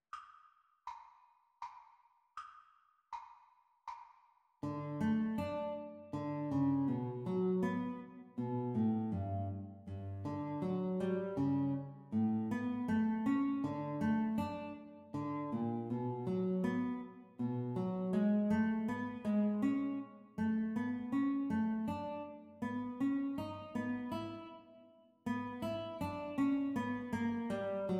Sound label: Guitar Duet Playalong Practice Speed; The Teacher part